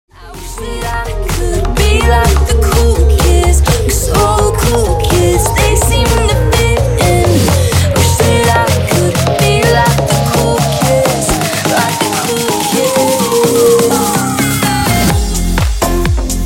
remix

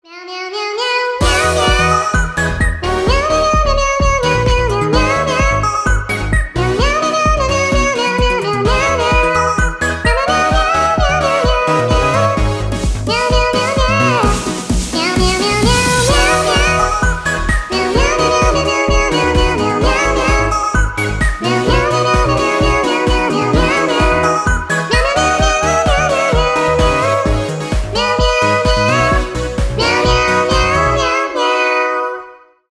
cat cover; bongo cat

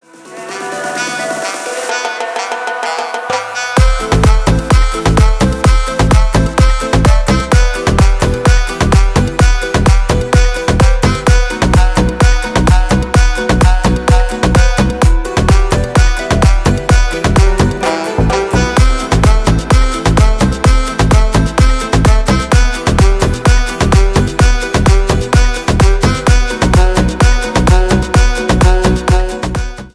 instrumental

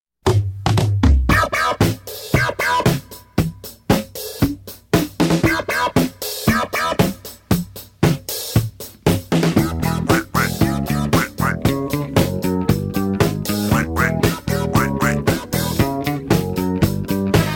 duck remix

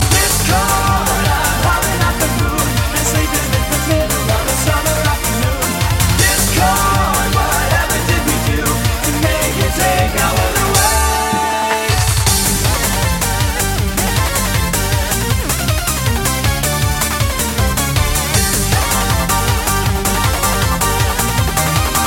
eurobeat; brony discord

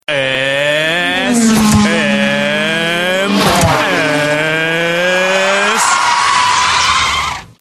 sms formula 1

